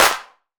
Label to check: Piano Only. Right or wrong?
Wrong